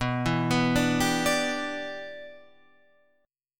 B Suspended 4th Sharp 5th Add Sharp 9th B; Bsus4#5add#9 arpeggiated; JGuitar

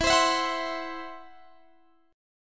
E Suspended 2nd Sharp 5th

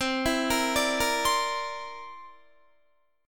C 9th, Guitar; C9 arpeggiated

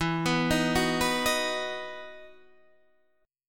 E Major 7th Suspended 2nd

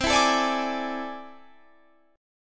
A Minor Major 11th

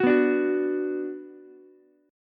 B Minor, Ukulele; Bm strummed; 11,11,10,9; JGuitar

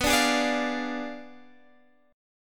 B Diminished 7th B down, guitar; strummed

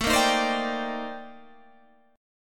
C Minor 9th, A, Guitar, Standard; strummed; JGuitar

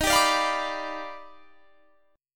D sharp Augmented Major 9th D sharp, chord; strummed; 23,22,24,22,24,22; JGuitar

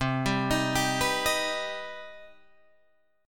C Minor Major 7th C Guitar Open G

